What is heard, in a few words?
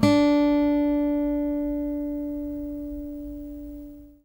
ACSMEDPICK, Guitar; Quantum Leap; Guitar & Bass